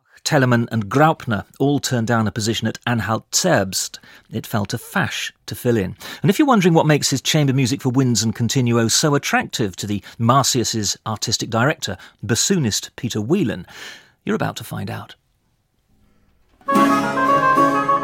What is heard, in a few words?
quartet in d minor for 2 violins viola and continuo